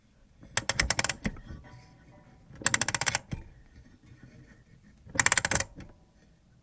Clock winding

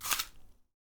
Apple Crunch

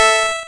8 Bit Countdown Go

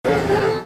Horror Reveal Shock

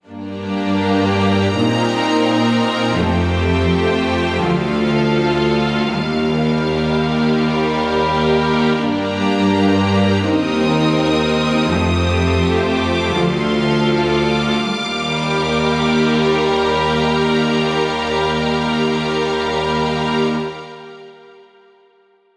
Orchestra Strings

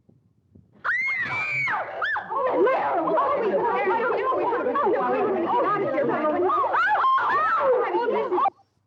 Women Screaming And Rapid Talking

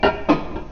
Metal clank

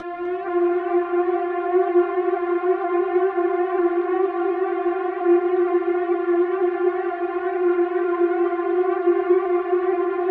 Alien Alarm Reverb

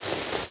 Footstep Grass

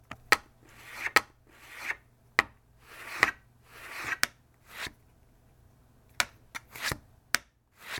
Sliding Atm Card

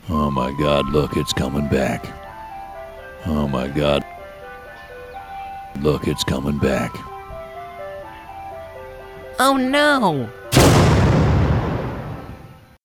Ice Cream

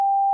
Short Censor Beep

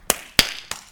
Slaps

